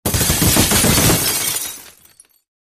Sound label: Multiple Bullet Hits, glass